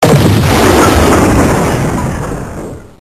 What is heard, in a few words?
bomb